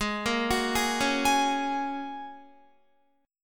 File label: Ab 7th Suspended 2nd Suspended 4th, Guitar, 4E,4A,5D,5G,5C,6F; 16,13,16,13,13,15; arpeggiated